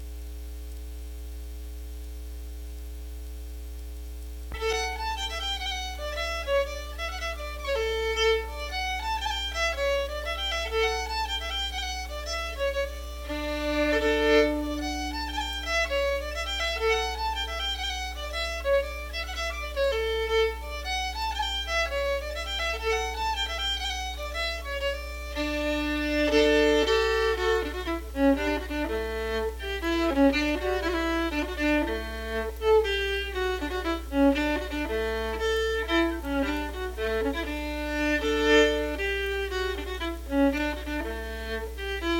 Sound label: Polska